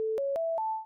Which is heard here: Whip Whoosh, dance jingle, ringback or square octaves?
dance jingle